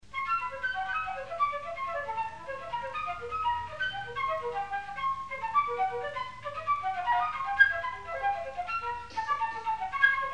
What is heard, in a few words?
toccata